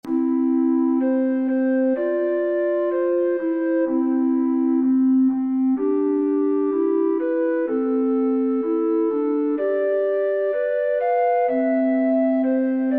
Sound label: Tenor Recorders; Last Saturday